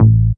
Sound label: DR. LOOP, BASS; X-Static Goldmine 3